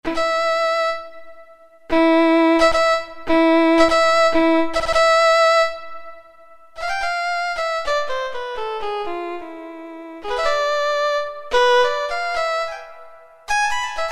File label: Violin, Roland MT-32